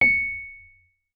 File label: C#7, Rhodes; Xsample VOL-1